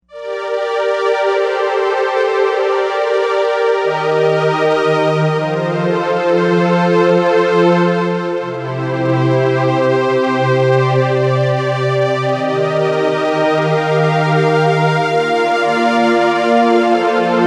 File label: STRING Stringy Beenz